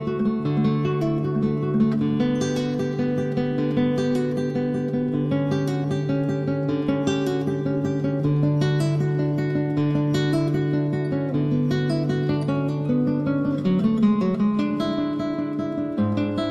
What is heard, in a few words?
Cello Suite No. 1- Prelude